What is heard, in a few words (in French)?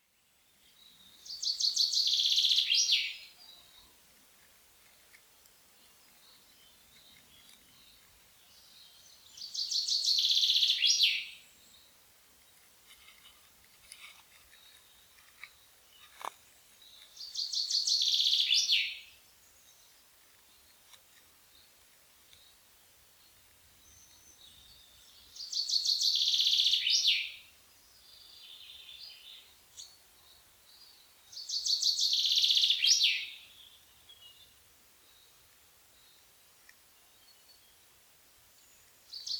pinson des arbres